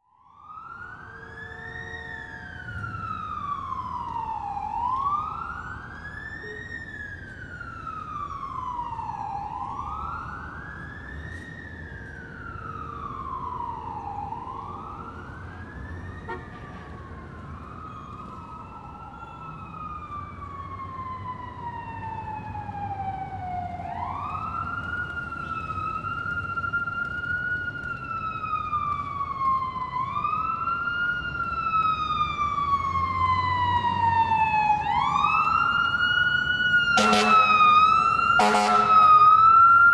Fire Engines emergency vehicles

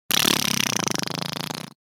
Fart Foley The Great Finale; Hollywood Edge; sound designer's toolkit v2

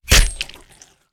Stab Bayonnet; Sound Ideas; Guns

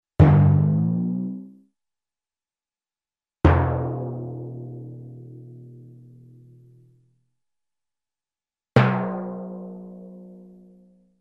Timpani Drum Hit Bounce, cartoon; Hollywood Edge; Cartoon Trax

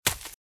Footsteps Leaves Sprint Right; RadwinIsland

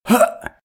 hurt; RadwinIsland

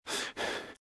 Breathe - Fast Heavy; RadwinIsland

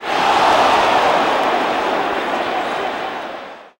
Baseball Crowd "Oh"; Crowds

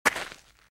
Footsteps - Gravel - Walk - Right; RadwinIsland

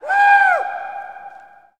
Hockey Male Woo; Hockey Vocal Sounds

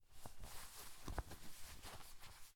body Pad; Apotheorasis

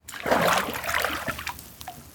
deep water; firefight

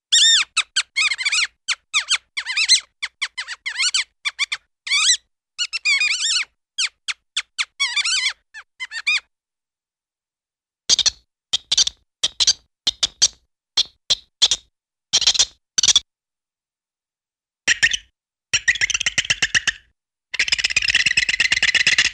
Mouse-Small Animal Squeaks Chatter Laughs; Cartoon; Hollywood Edge; Cartoon Trax

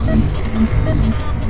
Health Station Amb Loop; SFX Kit; Sci-Fi Loops

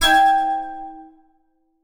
Mannequin

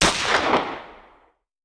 Hand Gun; Sound Ideas; Guns

